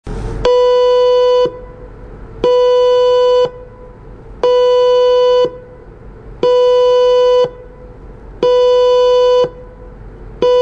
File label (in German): Generalalarm